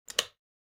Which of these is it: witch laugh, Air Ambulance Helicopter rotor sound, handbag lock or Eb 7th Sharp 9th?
handbag lock